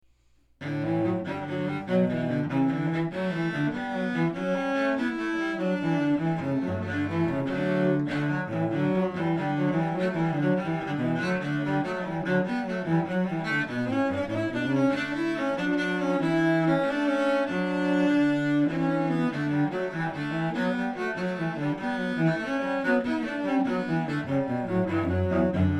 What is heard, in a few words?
Etude for 2 Cellos